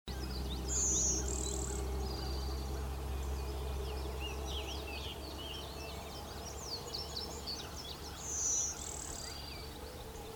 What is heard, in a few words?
Brewsters Warbler; Blairs Valley